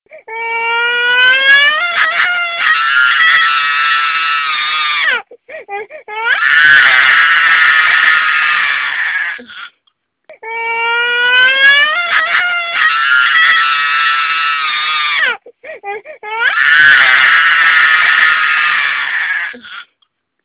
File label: Horror Scream